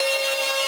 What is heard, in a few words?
synth main